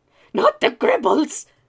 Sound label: Male Reactions